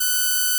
Inharmonicity F#6

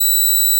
Gauss Filt B7

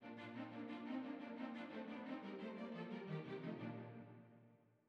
ORCH REDUC piano; Beethoven; Symph3; OutofContext

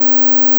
PeakSlope C4

